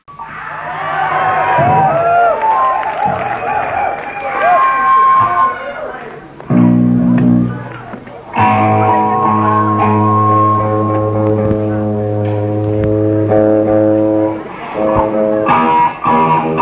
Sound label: tuning